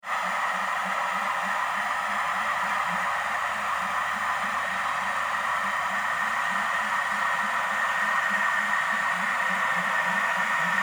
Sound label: dredger sand fulmar underwater sound